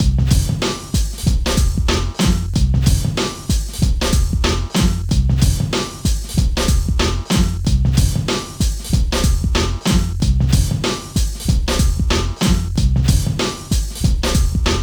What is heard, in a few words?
Menu Loop; NBA Street; GC; PS2